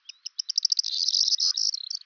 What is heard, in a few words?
bird, ambient animal